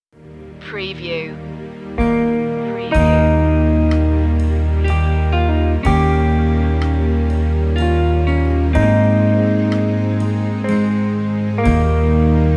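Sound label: amazing grace very slow verse 62bpm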